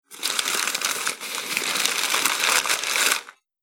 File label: Parcel Wrapping Paper Scrunchy Noise; Foley; Andrew Fleming